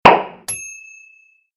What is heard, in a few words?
champagne cork